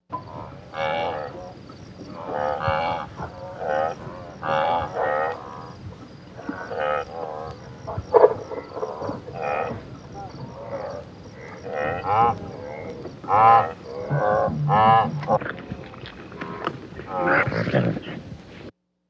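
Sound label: Wildebeests Waterhole Vintage; Miscellaneous Animal Sound Effects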